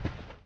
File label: cer run